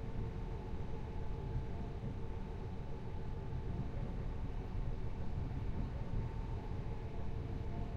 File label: Lift Move; MiSide